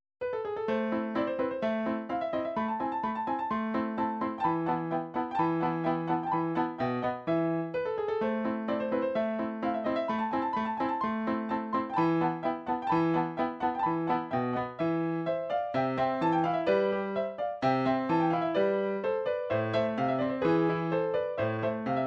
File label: turkish, midi; song book